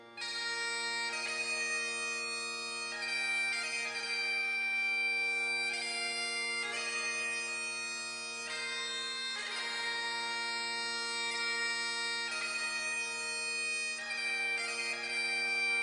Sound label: bagpipes squirrel